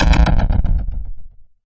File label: Tumble knocking